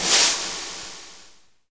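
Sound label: drum roll, Brushes; DRM Brushes; Roland - Rhythm Section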